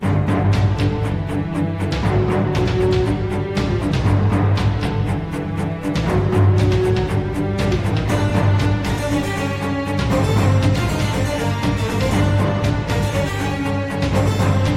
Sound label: ringtone